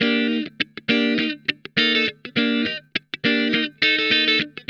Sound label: Funk Guitar; Zero G - Funk Guitar